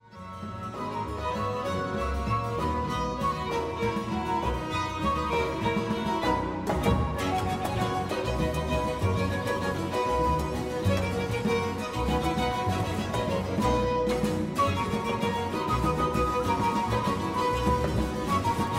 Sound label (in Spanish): Tonada El Congo a voz y Bajo para baylar Cantando; CÓDICE MARTÍNEZ COMPAÑÓN Tonada El Congo; festejo peruano